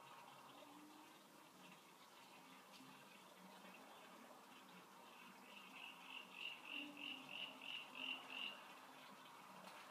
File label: Frog Call